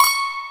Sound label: YANG-CH'IN; AKAI S6000 CD-ROM - Volume 1